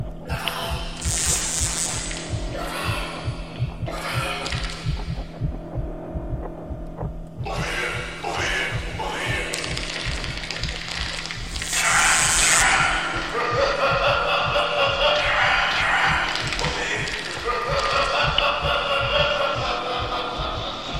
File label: Predator Sight Sound